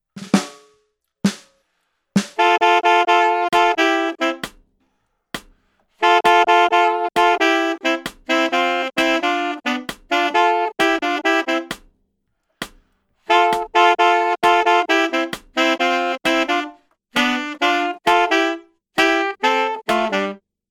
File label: La Bamba Pat Lead A 132; 16bit